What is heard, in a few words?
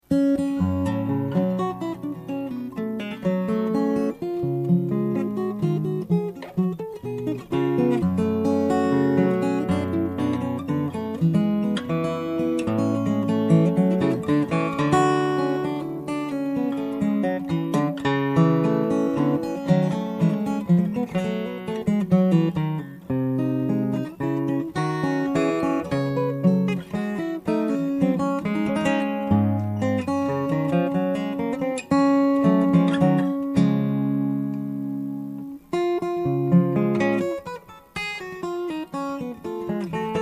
allemande Lute